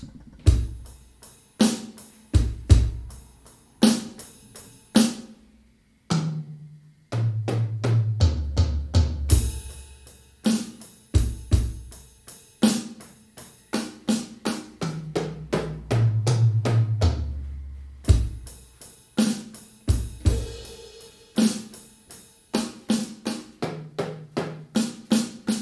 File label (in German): Schlagzeugsolo